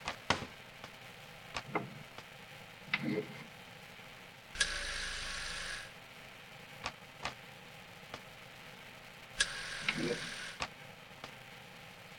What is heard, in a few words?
Lock Picker Picking